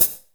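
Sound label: hi hat, drums; Best Service; Real Mega Drums VOL-1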